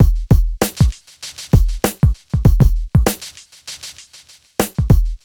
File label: 98 BEAT, Hip Hop R n B; AKAI; USB Soundscan vol.19